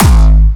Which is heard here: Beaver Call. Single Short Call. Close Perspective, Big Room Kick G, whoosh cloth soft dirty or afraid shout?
Big Room Kick G